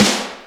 Drum Snare

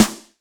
Snare M

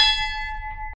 funky bell; osu

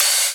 drum hit finish

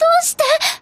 combo break

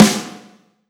SNARE; osu

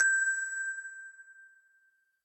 bell

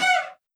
String Shots

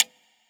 tick

